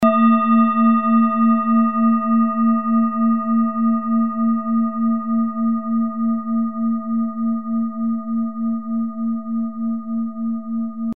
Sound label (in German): klangschale